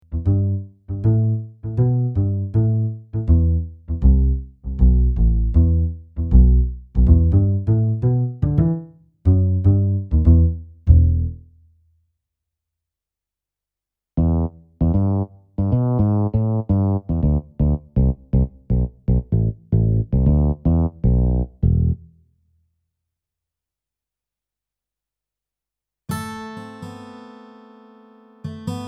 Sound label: CS6 Bass Guitar